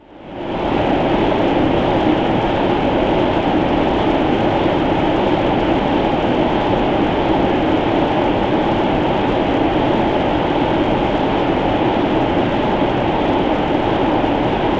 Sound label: wind